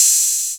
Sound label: OH, Open Hats; MZ OH [Redd]